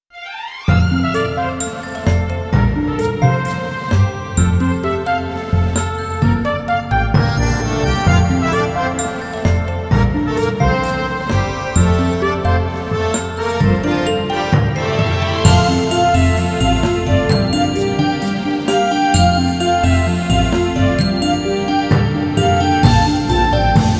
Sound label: toy tower; maplestory